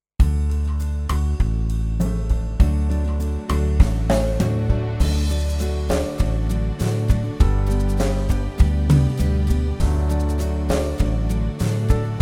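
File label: Ketron SD 90 Style Latin Lounge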